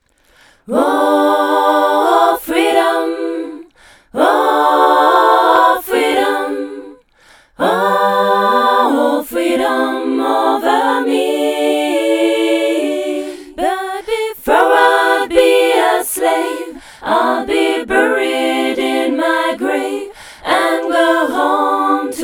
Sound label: tutti